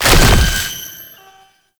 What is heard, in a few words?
Infested Lich Summon Tentacles Emerge; Warframe; Abilities; Zeke